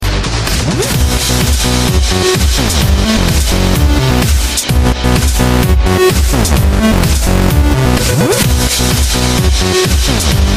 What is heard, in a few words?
Electro